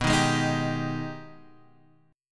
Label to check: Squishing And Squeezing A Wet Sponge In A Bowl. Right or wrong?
Wrong